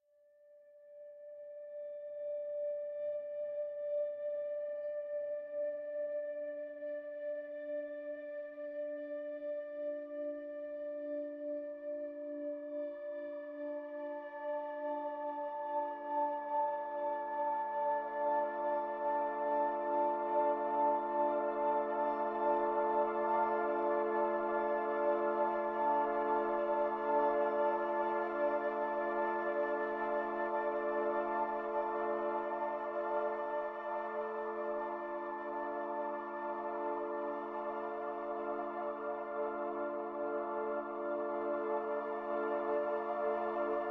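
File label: Synth strings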